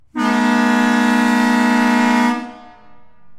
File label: Federal Signal 3 Chime